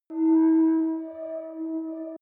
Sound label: warp preparation signal